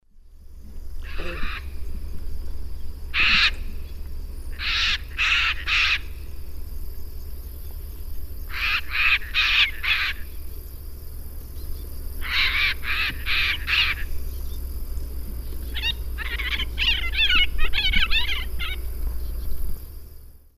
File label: Ara severus, flight calls pair; S. America; Eastern Lowlands